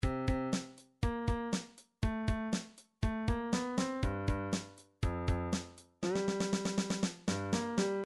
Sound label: fill, midi